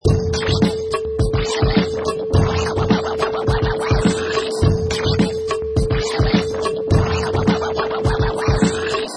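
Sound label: Scratch Groove 105 BPM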